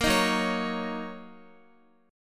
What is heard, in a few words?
F sharp 6th Guitar, chord; strummed; F#6; x,x,8,8,6,8; 4E,4A,5D,5G,5C,6F